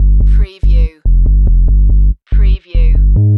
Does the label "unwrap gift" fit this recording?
No